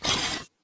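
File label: Tick Movement Servo Walk; Titanfall 2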